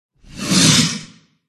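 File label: wyvern attack voice middle; viverna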